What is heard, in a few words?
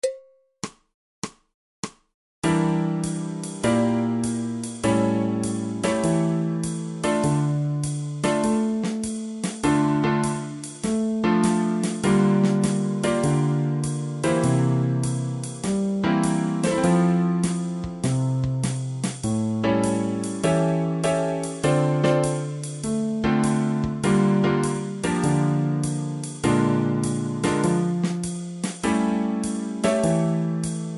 2beat, fretless bass